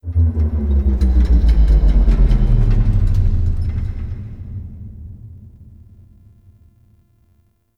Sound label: Guild ship thrusters, Ambience; Warframe; Misc Remaster